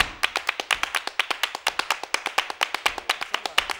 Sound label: MULTCLAP, MULTICLAPS; Best Service; World Colours